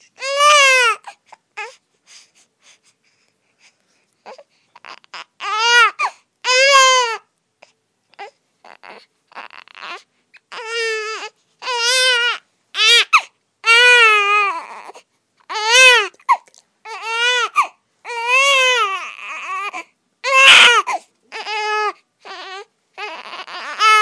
newborn crying

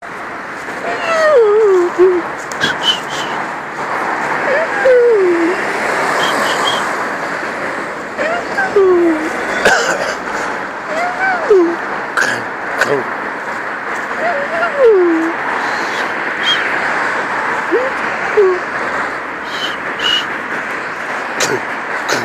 traffic sounds improv

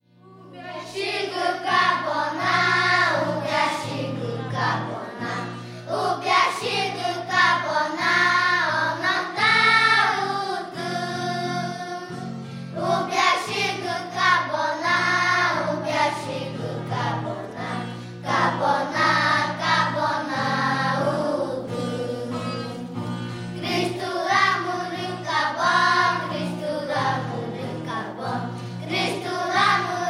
macushi village children s choir

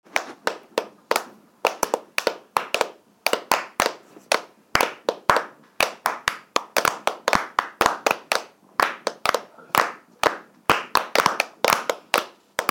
clapping piece

